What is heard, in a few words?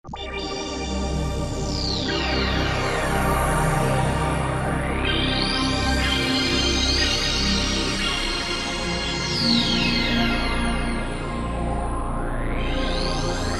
pad Even Mo EPIC!, E-mu PK-6